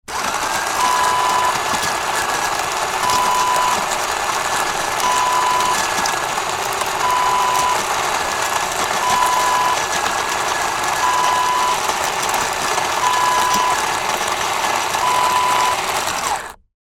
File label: coffee grinder